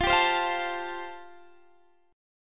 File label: G 9th Suspended 4th